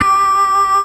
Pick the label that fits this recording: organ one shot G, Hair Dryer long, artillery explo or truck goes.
organ one shot G